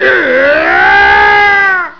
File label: eeeeyyaaaagh; shogun